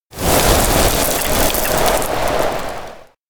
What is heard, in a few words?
pebble fly grassland; anemoi